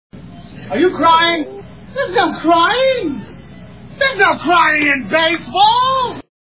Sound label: alo cry